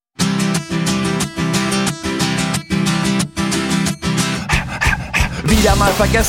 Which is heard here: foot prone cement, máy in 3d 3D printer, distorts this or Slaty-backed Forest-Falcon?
distorts this